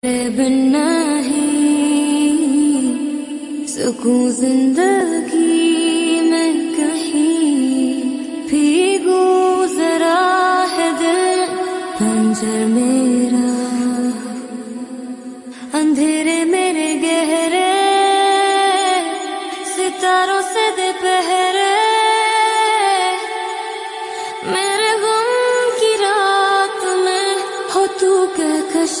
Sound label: pakistani drama ost ringtone